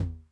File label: Tom Mid Low Tune Mid Decay, 909